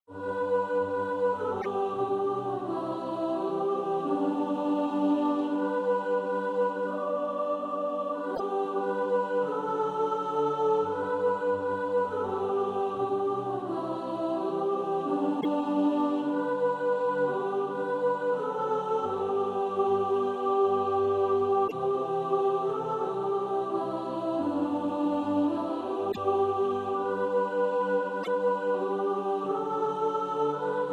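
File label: soprano, Practise Music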